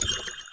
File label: MOVE; C64 Dreams; Multi-Sound Default